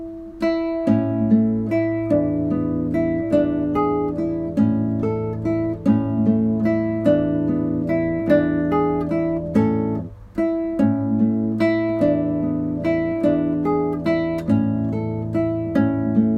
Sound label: Waltz